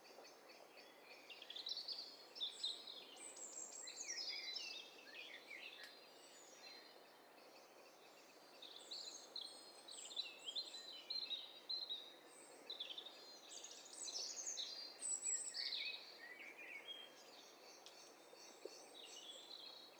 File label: STEREO BIRD COCKTAIL PARTY